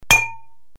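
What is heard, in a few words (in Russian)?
удар по бутылке но не разбилась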